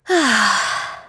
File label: Sigh; en; voices; heroes; kings raid